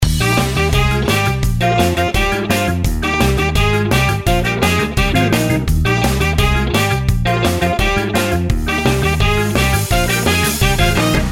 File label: ska party loop